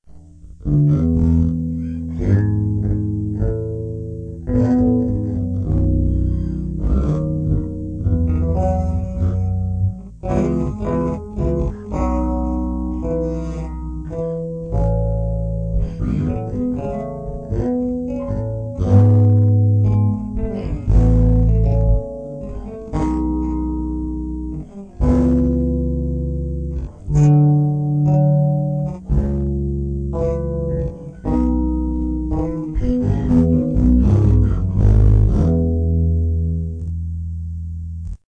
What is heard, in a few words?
bass